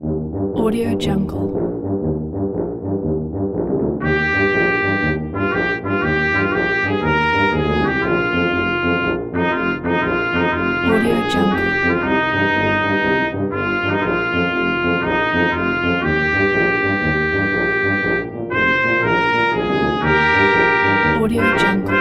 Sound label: brass quartet bavarian oktoberfest; happymusichappysounds